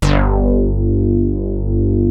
Prophet 5 G2; Hollywood Edge - Giorgio Moroder Rare Synthesizer Collection

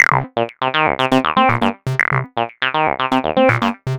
303 loops

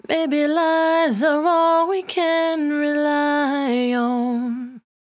68bpm D, Velvet Vocal; Zero-G

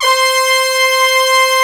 FULL BG BRASS, SYNBRASS; East West; Global Sounds

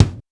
Roland U220 Dry Set; drum machine; 90 s Sample CDs; 300 Drum Machines

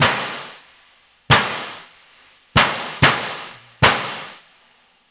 Hip-Hop Gong

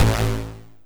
Synth Hit A

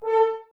Brass F.Hrns A; Miroslav Vitous - Woodwind Brass Ensemble

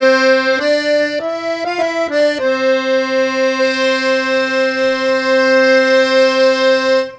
Accordion, Trip-Hop Loops; Sonic Foundry (Sony Creative Software) - Rondo Brothers Trip-Hop Loops & Samples; El Corazon

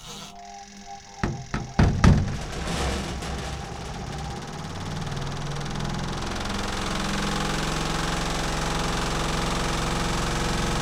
DRIER 1, LAUNDRY; AKAI S-Series CD-ROM Sound Library VOL-6

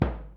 kick, Millenium MPS-400

